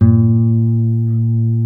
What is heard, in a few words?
B NYLON, Guitars